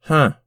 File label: chorus anger